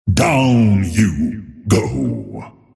attack pain; Earthshaker; arcana; Dota 2

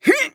Grunt Physical; Realm Royale; Mage; Nightshade

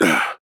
Grunt Attack

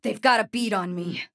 Taking Damage; Paladins; Kinessa

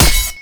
heavy sword impact; Dota 2

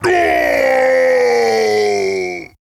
Falling; paladins; Raum

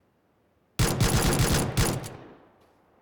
P1911 shots; battlegrounds

